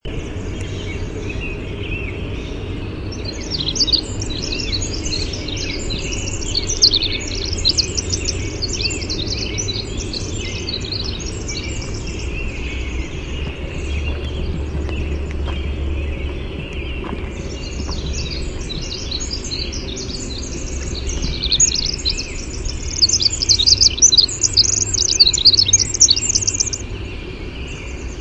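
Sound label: Winter Wren song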